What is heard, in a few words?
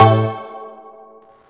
synth